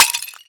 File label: pot smash start; Scrat